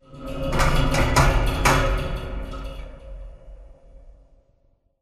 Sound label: metal stress; Titanfall 2